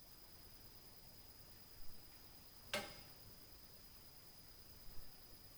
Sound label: METAL TAC NOISE